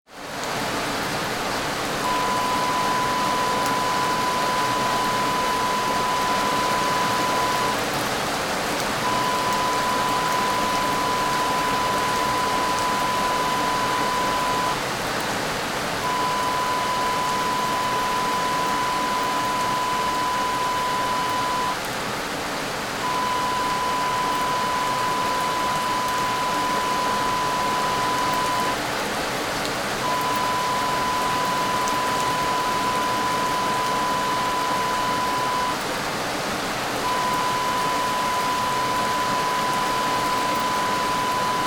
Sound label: rain